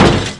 BLOWOUT, IMPACTS; RallyC

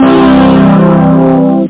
CHORD SYN

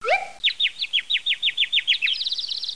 Outdoor Excitement; Cool Crafts; Multicom

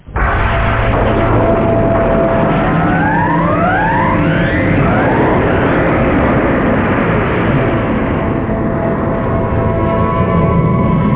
flashsh, effects, whoosh

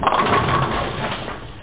pool

